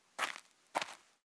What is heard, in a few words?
Footsteps; Age of Empires III; cinematics